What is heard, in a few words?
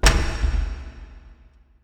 click; Hellboy